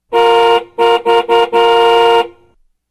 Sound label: horn notification